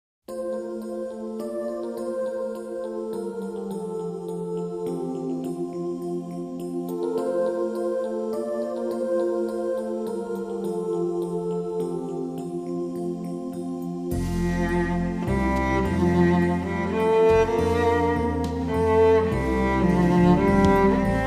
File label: Ambience Cello